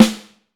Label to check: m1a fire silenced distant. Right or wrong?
Wrong